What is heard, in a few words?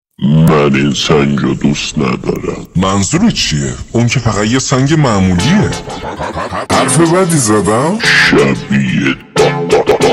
funk slowed